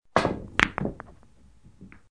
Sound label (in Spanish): Billar varios